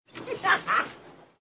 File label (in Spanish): risa; kurt russell